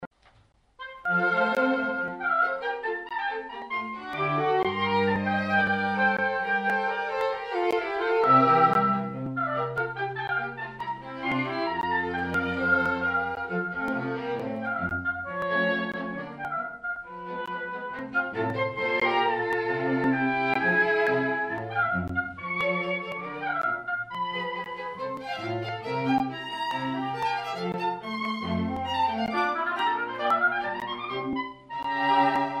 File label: Oboe